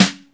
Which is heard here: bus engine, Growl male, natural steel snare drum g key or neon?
natural steel snare drum g key